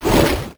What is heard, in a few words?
tarmonster hit; Crypt of the NecroDancer